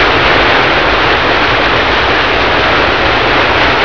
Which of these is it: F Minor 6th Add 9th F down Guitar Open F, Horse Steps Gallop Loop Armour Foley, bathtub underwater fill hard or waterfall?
waterfall